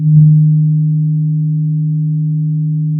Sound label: Sound of a church bell